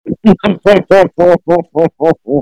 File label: laugh; tango